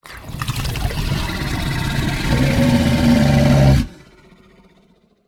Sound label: struggle